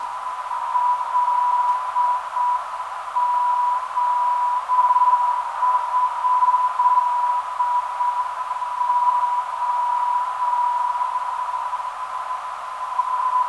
LO 315kHz

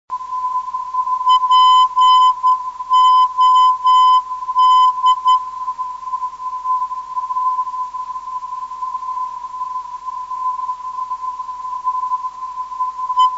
335khz

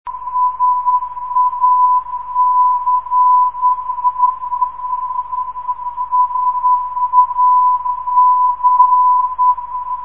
sac 535khz, ndb

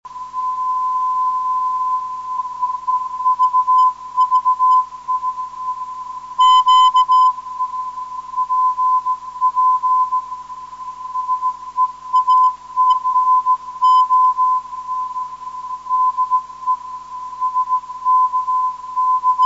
10133khz, hf beacon; sk6rud

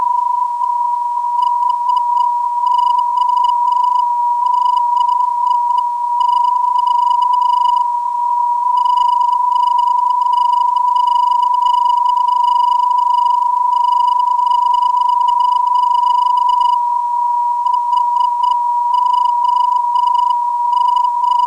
406kHz, NDB; HOZ

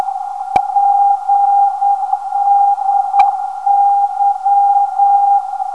392kHz, NDB; GDY

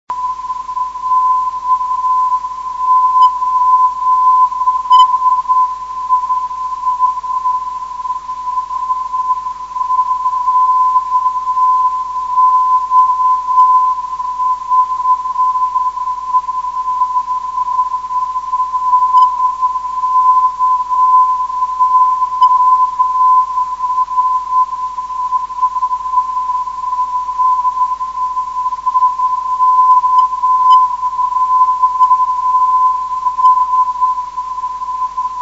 311khz, ndb

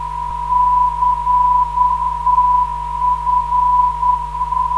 407kHz, ndb; CTF

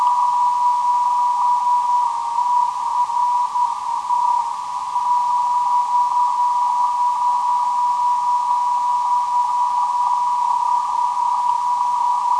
trt 322khz, ndb

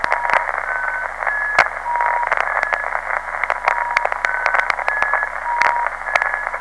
alpha 12.6 kHz

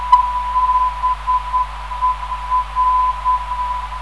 335kHz, ndb

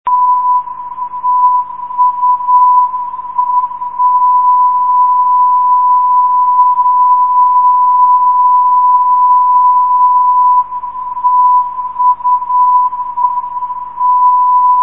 445khz, ndb

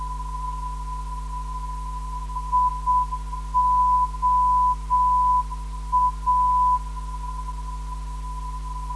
340kHz, NDB; IOA

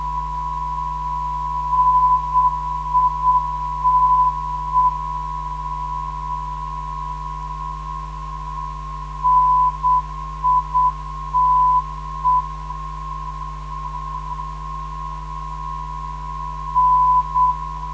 NITE 396.9kHz

